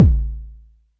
BD

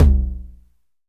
Tom Lo 909 Clean

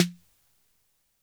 SD 808 Air

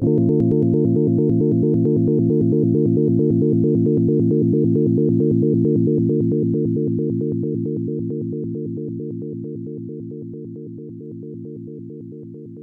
SEQUENCE Linear

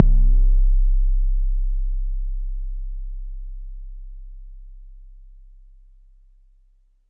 BD

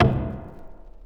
Sub37 Knock Verb Vinyl Synths C3; vinyl perc

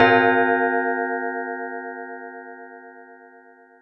Bell; EFM

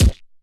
BD Chunk Stereo

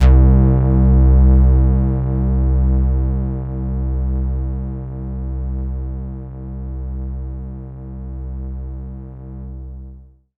BASS

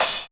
Crash Acoustic Chop

